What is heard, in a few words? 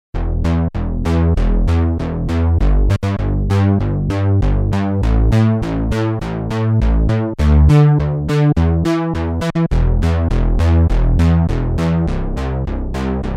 SYNTH BASS, Korg DW-8000; Factory Patches